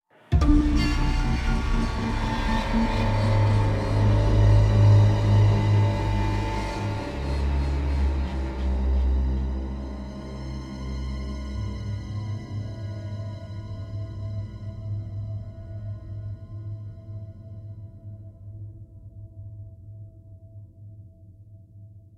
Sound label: Opening, Classic Horror Sci-Fi Music; Dead Ark